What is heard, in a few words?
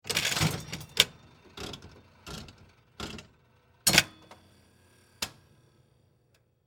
Slot Machine Pull Lever Spin Stop Mechanical Casino; Sports